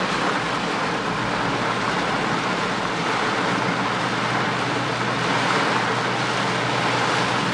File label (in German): regen im cabrio